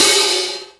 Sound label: HAT, Hip Hop; HipHop Kick Snr Hat; PlatinumPhattCD1; E-MU Producer Series Vol. 8 – Platinum Phatt